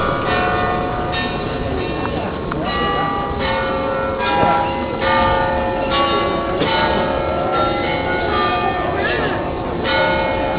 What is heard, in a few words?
westertoren long